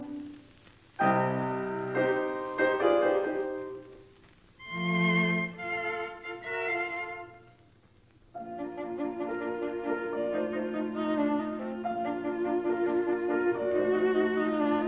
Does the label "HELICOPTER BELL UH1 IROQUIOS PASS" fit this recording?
No